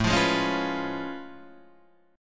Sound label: A Augmented Major 9th A down Guitar Standard; strummed